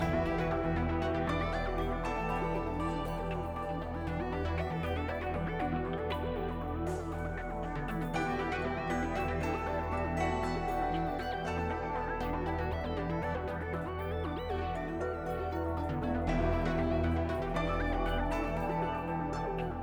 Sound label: Ambi General; netent